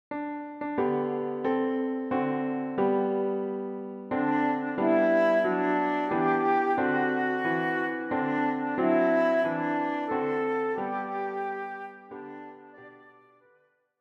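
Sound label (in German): Querflöte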